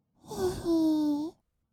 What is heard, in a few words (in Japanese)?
"いひー", kawaii voice; 1200 kawaii voice!!